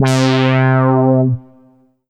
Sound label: Yamaha TG500 Wow C3